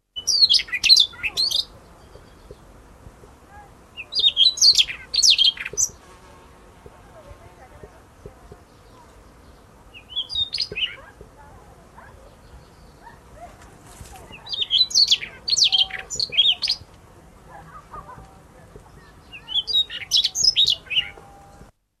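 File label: solovey krasnosheyka voice, Siberian rubythroat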